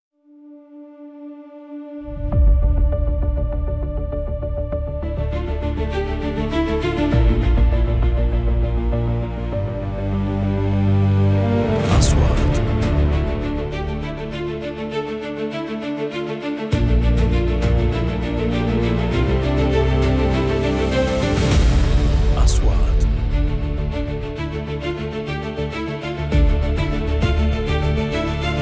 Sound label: action